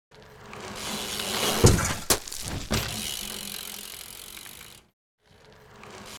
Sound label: Bicycle fall falling down losing control